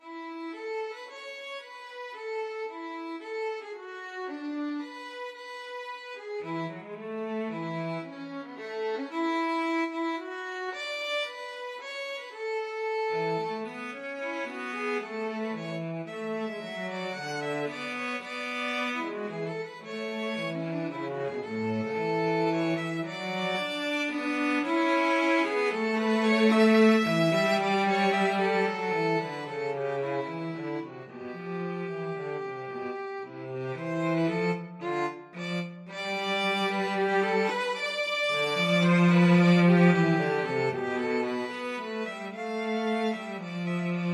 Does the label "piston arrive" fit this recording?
No